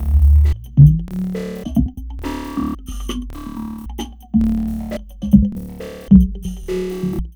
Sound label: FXBEAT